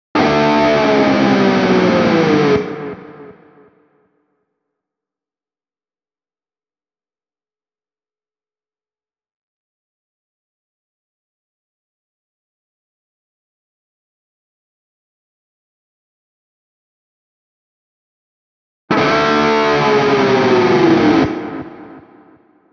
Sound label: Background Dives